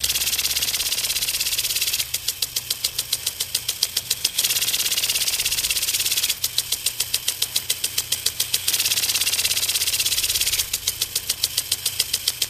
Sprinkler Plastic, sprinklers

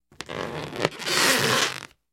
Balloon Squeak Stret

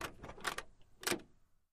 Camaro Door Open; chevy camaro

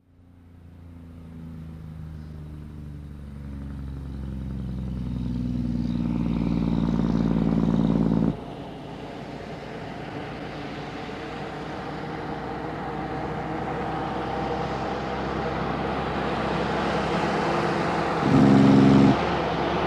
Truck Diesel W downsh

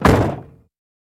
Cadillac 64 Door Clos; cadillac 1964

